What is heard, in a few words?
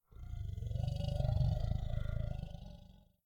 grunt idle; fenrir